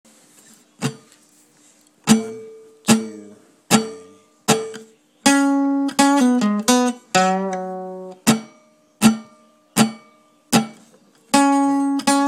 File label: solo